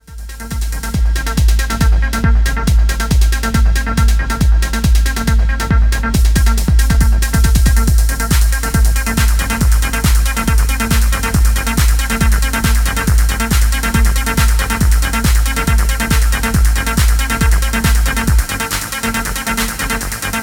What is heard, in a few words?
Trance Mixx